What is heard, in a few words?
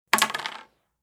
dice